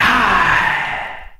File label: "ah" exhale